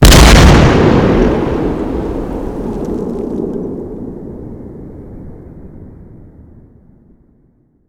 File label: Artillery; Red Orchestra 41-45